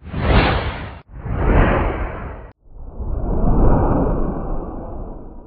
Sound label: darklord swing; svencoop; source of life